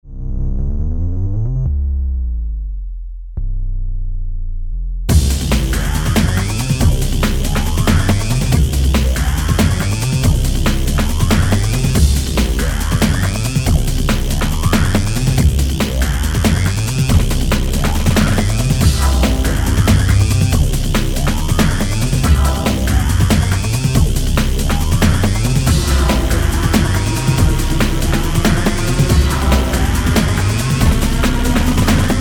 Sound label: Instrumental